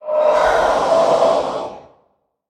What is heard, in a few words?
Windswept Elemental Attack